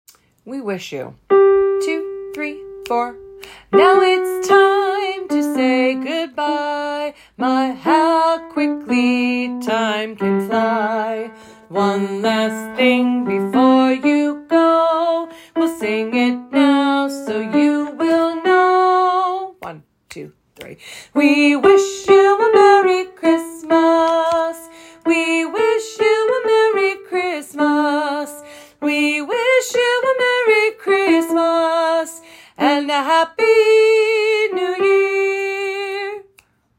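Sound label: We Wish You Soprano; 2022 Winter Music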